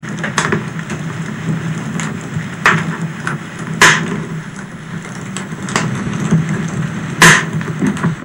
Feuer

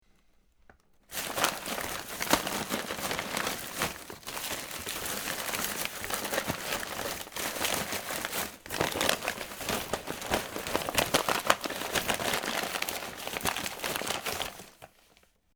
wuehlen

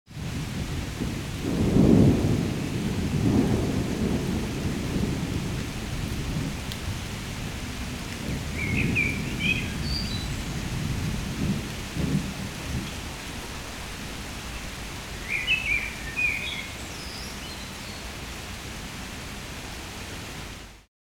gewitter

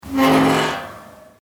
Metallquitschen

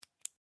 Kugelschreiber rein raus

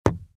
Auto Sonnnenblende

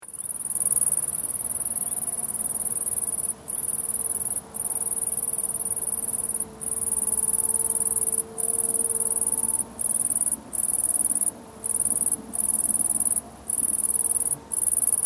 Grille solo

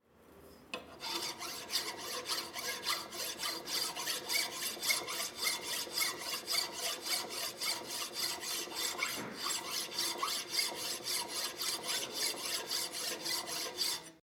Feilen

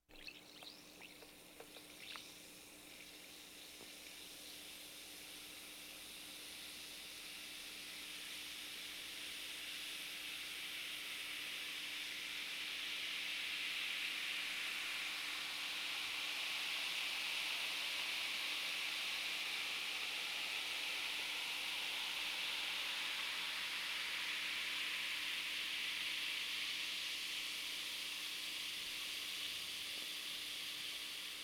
Bei Tisch Mineralwasser Kribbelt

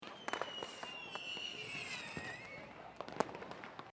Silvester Pfeifer

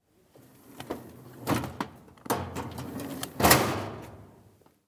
Schublade

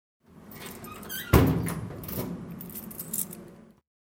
Metallschrank und Schluessel

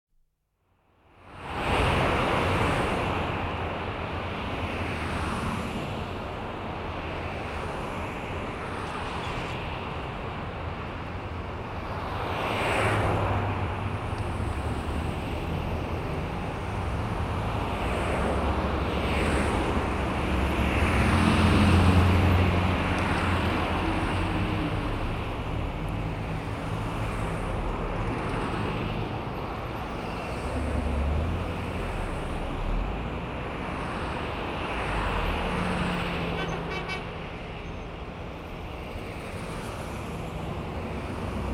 Grossstadtgeraeusche Reger Verkehr

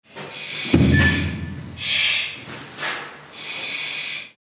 Feuerwehuebung Atmenluft

